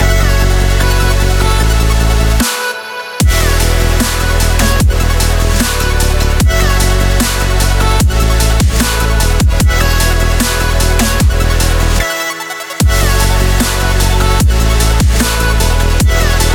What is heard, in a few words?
powerful intense dubstep loop